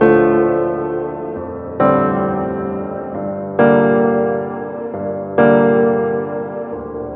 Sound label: piano, sad loop